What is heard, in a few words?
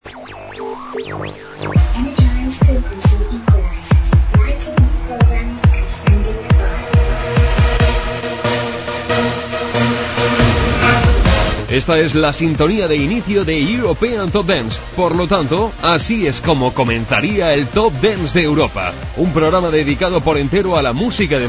european top dance